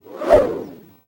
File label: designed whoosh whoosh; transitions and movement